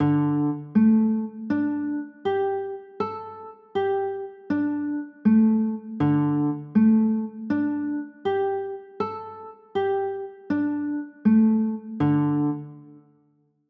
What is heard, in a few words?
d sus4, chords